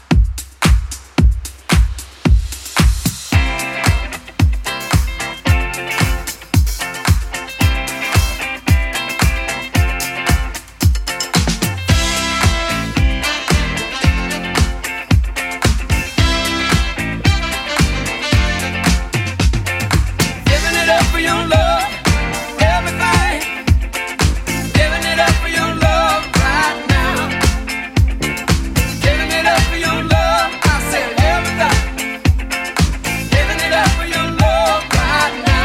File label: 80s Club 112 bpm